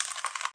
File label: cut pomegranate